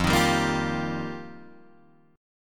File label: F 9th Suspended 4th F Guitar Drop D, chord